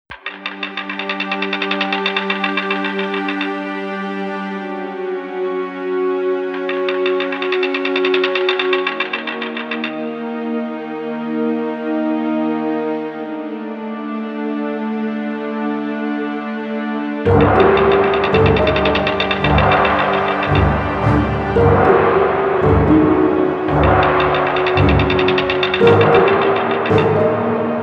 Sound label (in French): le grand bourdon; super castel